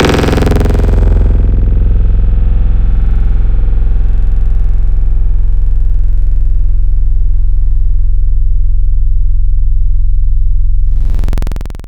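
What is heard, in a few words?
Grimey bass one shot; Synth One Shots; Synthetic's Serum Collection; Echo Sound Works Core Tables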